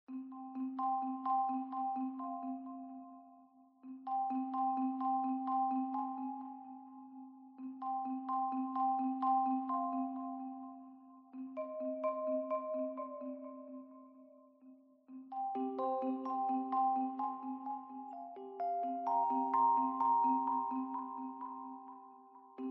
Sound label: Documentary Underscore